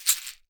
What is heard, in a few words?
CAXIXI, AFRICAN PERC; Greytsounds VOL-1